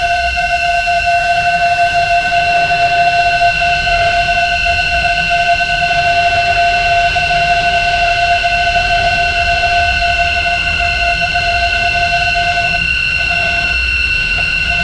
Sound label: generate a biomech startu, awakening; biomech startup